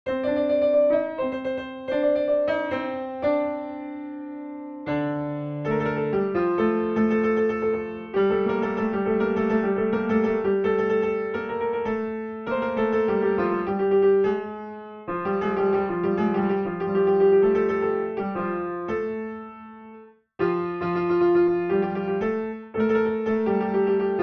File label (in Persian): Gilaki, piano; Dashti; Radif Mahjoubi